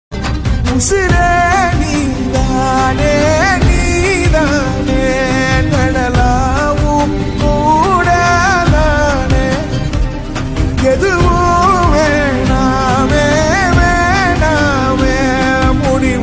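background music ringtone tamil background music ringtone; rayaan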